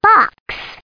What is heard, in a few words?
box